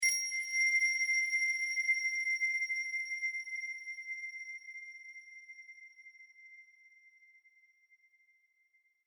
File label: Temple Courtyard Bell Single High Tone